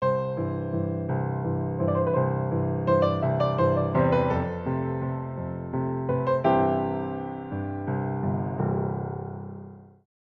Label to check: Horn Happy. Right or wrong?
Wrong